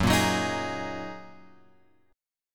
F sharp 6th Add 9th F sharp; F#6add9 Chord {2 1 4 X 4 4} strummed

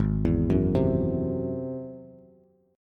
Ab Augmented 7th Ab down Bass; Ab+7 arpeggiated; JGuitar

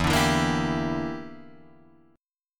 E Minor 7th Flat 5th E, Guitar Drop D

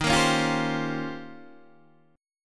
D sharp 13th, Guitar, DADGAD

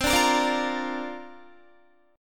C 7th Suspended 2nd Suspended 4th, Guitar; C7sus2sus4; strummed; 20,17,20,19,17,17; 4E,4A,5D,5G,5C,6F

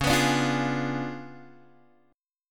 C sharp 7th Flat 9th Guitar Open G, chord

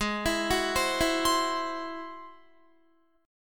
Ab Augmented 7th Ab down Guitar; Ab+7 arpeggiated; JGuitar

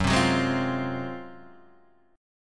G sharp 13th F sharp

